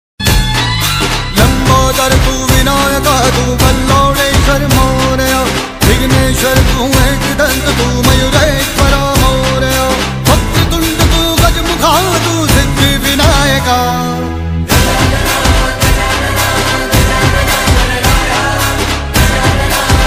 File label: bhakti ringtone